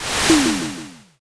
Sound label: fire magic